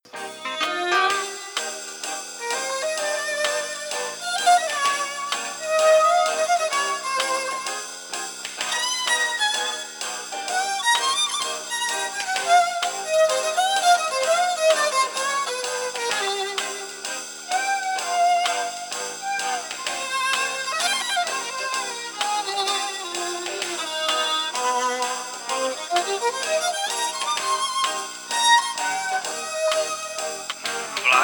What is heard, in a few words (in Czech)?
housle filtr